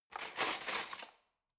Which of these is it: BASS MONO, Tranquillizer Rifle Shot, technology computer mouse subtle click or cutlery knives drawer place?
cutlery knives drawer place